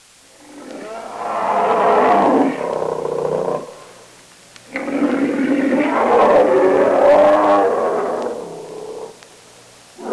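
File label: BEAR GROWL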